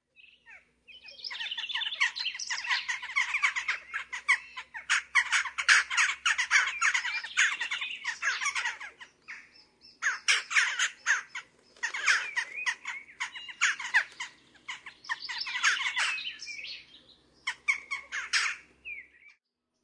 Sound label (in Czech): kavka obecna